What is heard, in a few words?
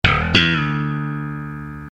sitcom transition bass riff; seinfeld